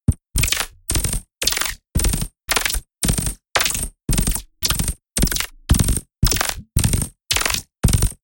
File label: sci-fi machine gun